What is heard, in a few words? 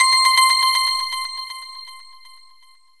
Item Equ